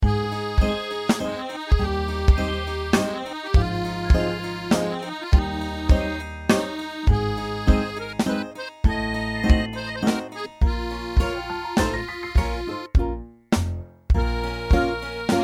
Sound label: drumming garage band waltz